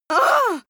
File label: female hit; soundpool